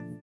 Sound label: VIBRA; Spectrasonics; Metamorphosis